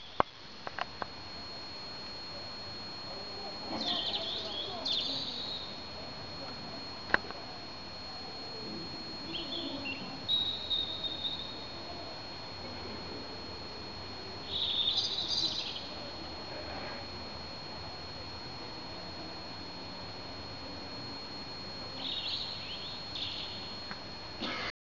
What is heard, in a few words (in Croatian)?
nocni pjevac duga snimka; Jarun